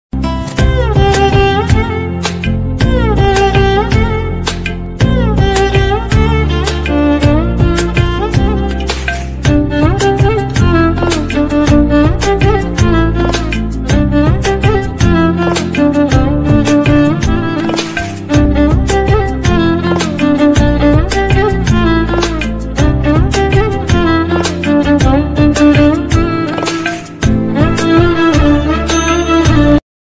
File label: telugu bgm ringtone south indian bgm music